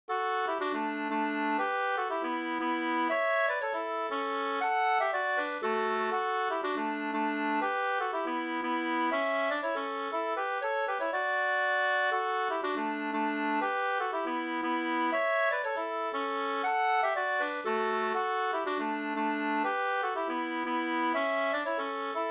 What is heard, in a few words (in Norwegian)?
pols Rorospols; Marknadspols fr Brekken utl av Jonas Hjalmarsson 2st